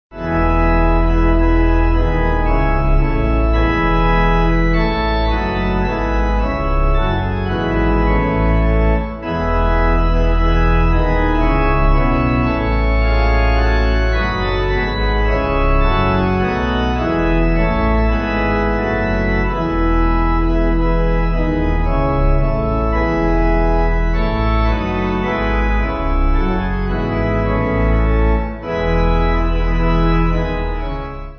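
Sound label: Pipe, hymn backing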